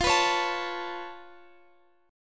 C sharp Augmented 7th F, chord

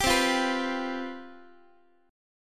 C Major 7th Suspended 4th, down, Guitar; CM7sus4 strummed

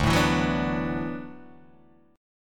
D 6th Add 9th D, Guitar, Open D

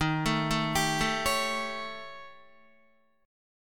D sharp 7th Flat 5th, Guitar; arpeggiated; chordshape; JGuitar

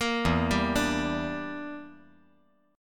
E Minor 7th Flat 5th E

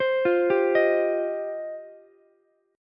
G 7th Suspended 4th Sharp 5th, Ukulele; G7sus4#5 arpeggiated

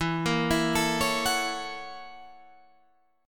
F sharp 7th Sharp 9th, E

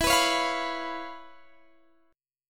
C sharp 7th E; C#7/E; strummed

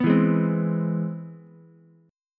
C Augmented Major 7th chord, Ukulele; strummed; JGuitar

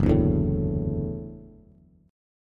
F sharp Minor 7th Sharp 5th, Bass; strummed; JGuitar

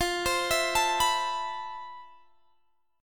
F Major Sharp 11th, Guitar, Standard; arpeggiated; JGuitar

